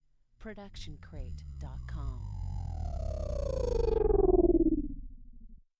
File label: Rotor Charge Down; SoundsCrate